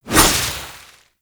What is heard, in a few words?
Hover Whizzby Envy; Pax Duviricus; Warframes